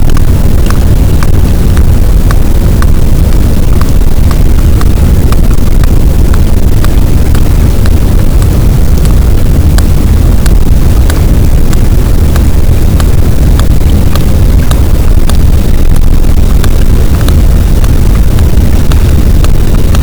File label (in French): cachalot positif, boat, Physeter Macrocephalus